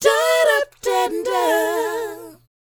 DOWOP B, Vocal R&B DOO WOP; ILIO; Vocal Planet

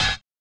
collision; Spectrasonics; Metamorphosis

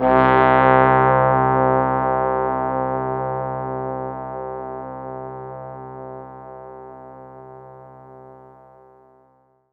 C3, Synth Line; 90 s Sample CDs; NiceBeats; SynthLine VOL-24

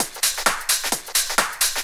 drt 130 addon, DRUMLOOPS, LOOP DANCE

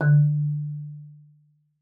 marimba D3; Xsample VOL-4

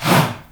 HA, SHOUT, Heart of Africa

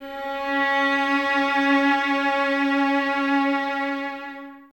violas C#4, String Ensembles; Miroslav Vitous; VLS 1+2

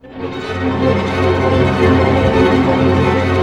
ARP C, STR ARP DIM; Sound On Sound - Peter Siedleczek's Orchestra

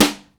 DANCE SD, DANCE KIT

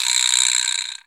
vibraslap; Cuba

